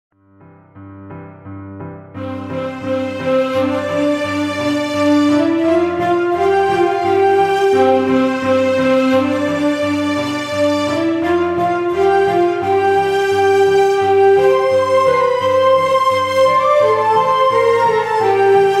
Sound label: Ringtone